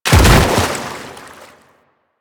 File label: Bodyfall Light Water; Enemies; Soldiers; Foley; Body Falls; Warframe; Lasrian